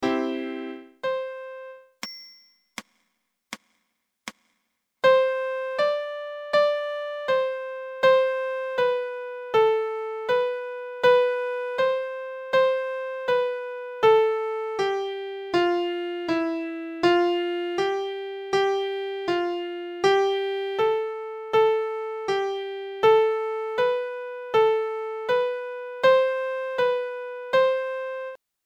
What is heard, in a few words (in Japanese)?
ピアノ音声